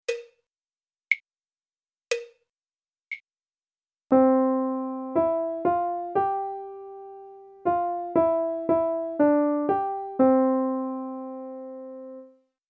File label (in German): Melodie; 5 Takt 3-4